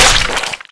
zombie blood spray; survivor, splat

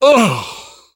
hurt minor, survivor voice

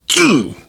hurt minor, survivor voice, biker

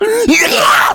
rage, infected, male; npc, action

